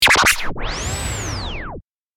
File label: DJ vinyl scratch